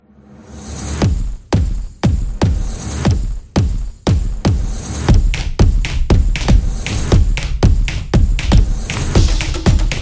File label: A Percussion Opener; drumming action